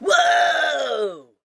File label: surfer death